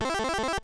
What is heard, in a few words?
MOVE; C64 Dreams; Multi-Sound Default